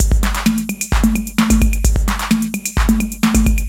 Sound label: Beat (130BPM), Gated Reverb; musicradar